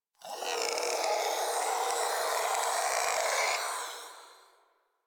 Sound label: Shadow Vocal Idle; Warframe; Duviri